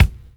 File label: BD, BASS DRUM, 70's Breakbeats